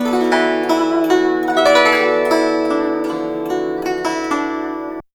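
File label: guzheng; Spectrasonics; Heart of Asia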